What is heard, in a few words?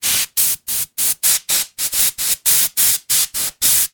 industrial compressed air aerosol spray bursts short against object; zapsplat